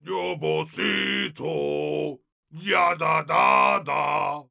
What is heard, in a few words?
Heavy singing, es; La canción del Navegante Volga de Mily Balakirev